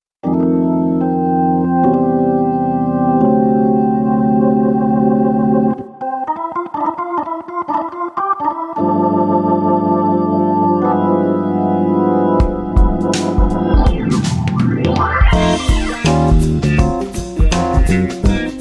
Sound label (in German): Hammond Funk